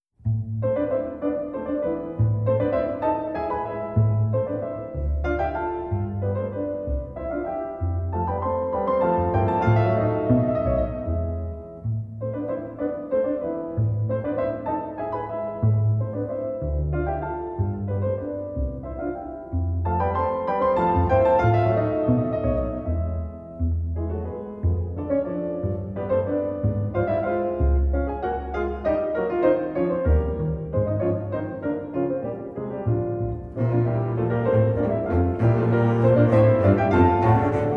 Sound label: Sonata in B-flat Allegro scherzando